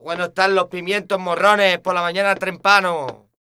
Flamenco, Vocals, Male

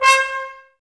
BRS TBSC, Brass, Tbs Mute; Miroslav Vitous - Woodwind Brass Ensemble

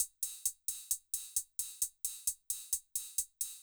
Beat, Old Skool House, 132bpm; Big Fish Audio; Marshmellow; Construction Kits

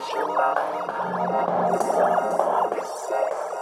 SFX, Trance NRG

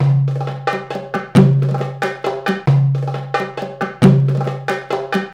PERC LP, Arabian Traditions; USB Soundscan vol.43; AKAI

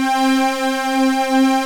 OB, OB modular; Greytsounds VOL-1

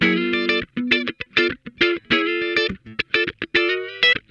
FUNKIN, Funk Guitar; 90 s Sample CDs; Zero G

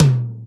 Yamaha CS6, Drums

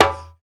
African Perc

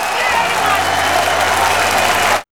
CROWD

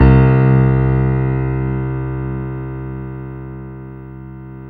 PIA MICRST, MICRO-PIANO, Roland; Sound & Vision - Gigapack II

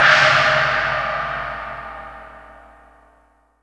CYM, Dance Drums; Best Service ProSamples vol.15; AKAI

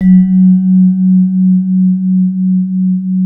MLT VIBE

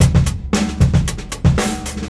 PEARLS 114, DRUM

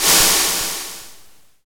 DRM ROLL, Brushes; Roland L-CD701

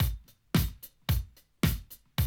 110, Classic Drum Loops

